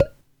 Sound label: pipe Hit; World Of Goo